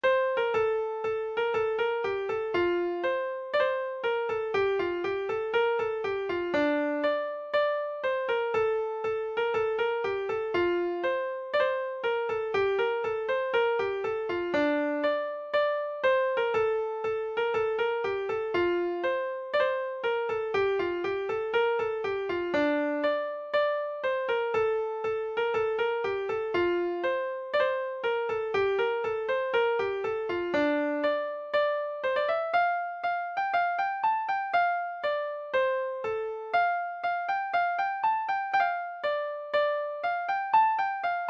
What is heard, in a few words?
old dumbarton drums